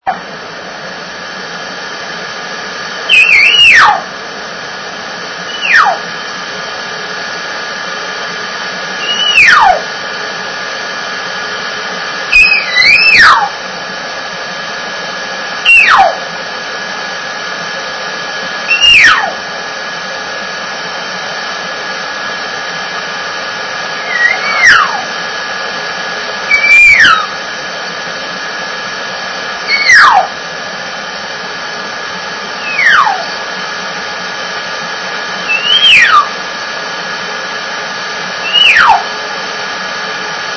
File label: cow, elk; elk 2001